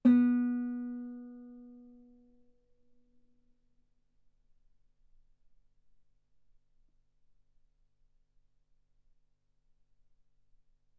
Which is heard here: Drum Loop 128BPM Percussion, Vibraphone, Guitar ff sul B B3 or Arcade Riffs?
Guitar ff sul B B3